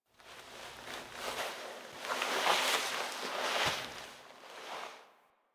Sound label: React Wall Pulse Operator Foley Get Up; Warframe; The War Within